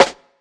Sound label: Rim Hard, Drums